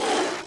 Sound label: perc lion, mixed; drumkits; percs; pierre lion, mixed; fl studio; xander brown drumkit